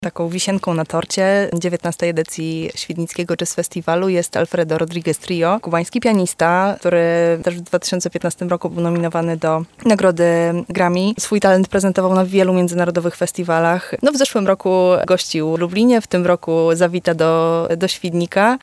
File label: jazz